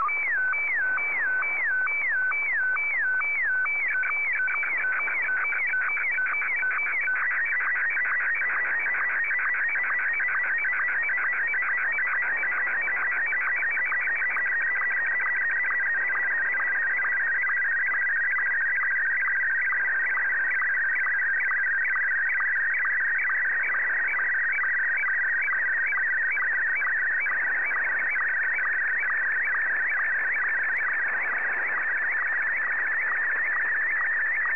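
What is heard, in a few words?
SSTV, Martin 1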